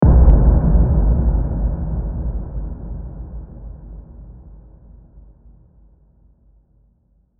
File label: Impact EQ